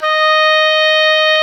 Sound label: OBOE; Sound & Vision - Gigapack I CD 2 (Roland); Brass&Woods1